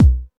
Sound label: boom bass drum one shot B key, Royality free kickdrum sound tuned to the B note; kick drum samples